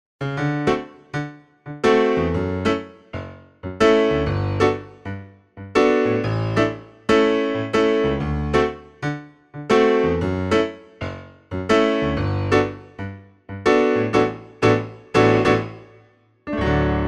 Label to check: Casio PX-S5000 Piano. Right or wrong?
Right